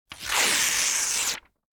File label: paper rip